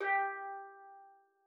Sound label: G3, STEELDRUMS; Xsample VOL-4